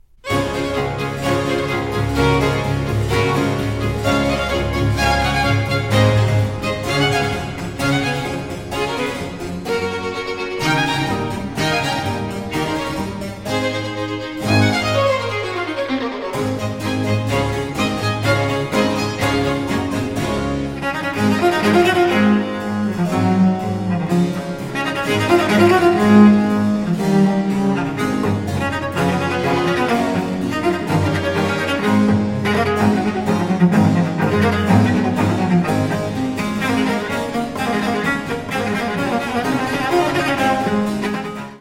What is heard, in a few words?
concerto for cello in D major, allegro